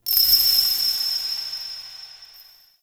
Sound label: Interior Orbital Station Ring; Warframe; Tau